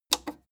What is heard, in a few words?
plastic button small simple click slow